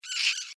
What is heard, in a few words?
Insect Voice Cry, Animal, Insect, Voice, Cry, Emotion, Vocalization, Bug; Pain; Blast Wave